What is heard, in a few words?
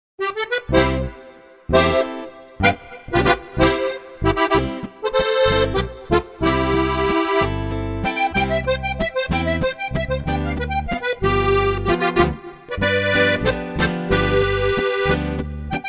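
a zuenftiger walzer, Steirische Harmonika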